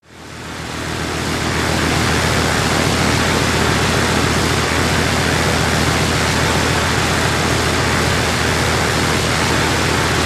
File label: Plane P38 Exterior, WW2 Fighters P-38 Steady; Airplane P-38 Exterior Steady Close Perspective